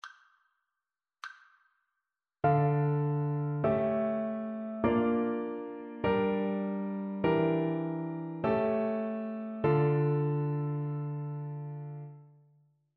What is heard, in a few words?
harmonic dictation